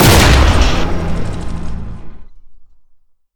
propulsion fire, weapons; deluge

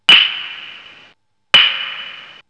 clappers; dojo

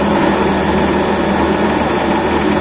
pump loop; hydrophobia

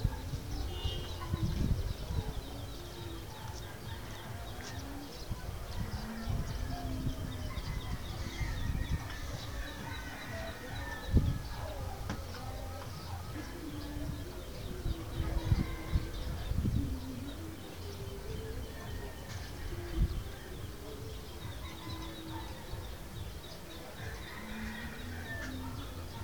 urban ambient

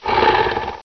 gonome pain; mystic radar; mr zombie1

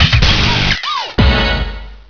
grenade; kart race

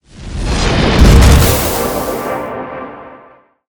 portal

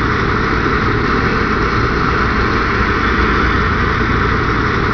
big pipe

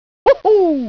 death; mario

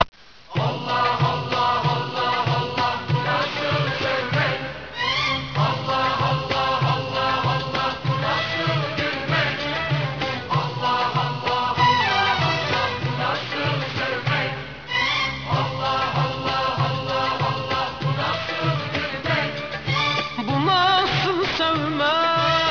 allah song

